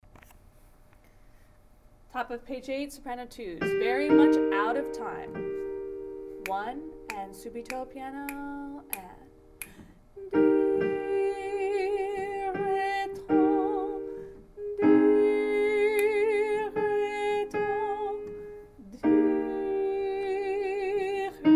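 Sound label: SSA Soprano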